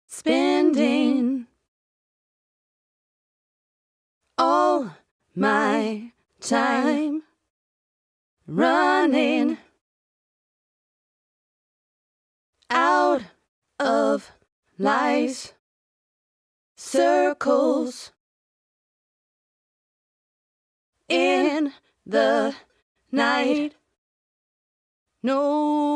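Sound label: house dance 115 B major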